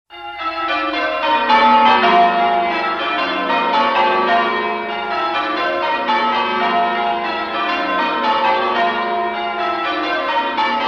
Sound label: church wedding bells